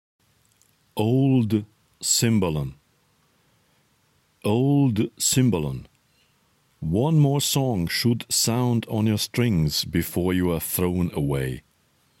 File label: Old Cymbalon; Blazovic